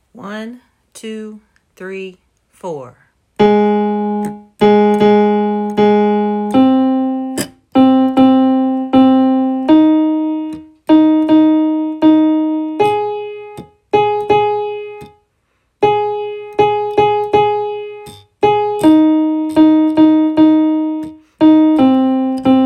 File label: winds